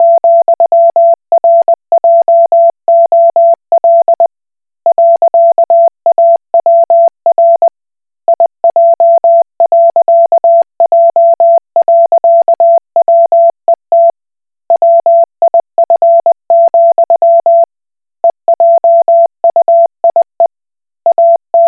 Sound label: Koch Morse